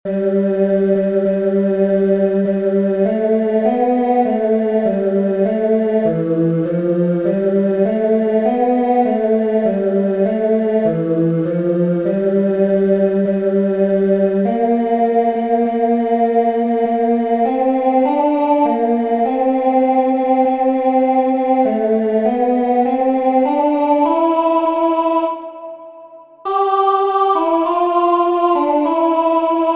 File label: Fanfare